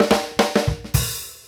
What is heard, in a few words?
FILL, Grooves Filz Hitz